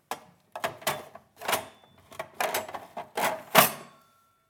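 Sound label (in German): Espressomaschine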